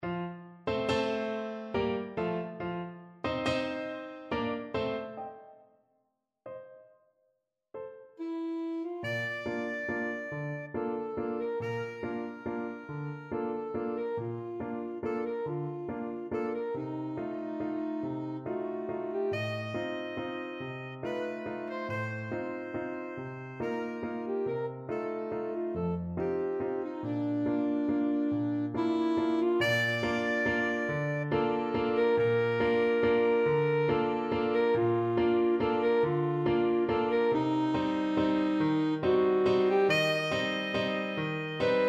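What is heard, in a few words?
viennese blood waltz alto saxophone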